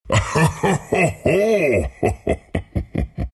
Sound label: treant laugh; dota